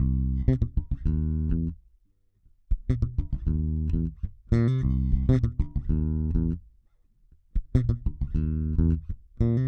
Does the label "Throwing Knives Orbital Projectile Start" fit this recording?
No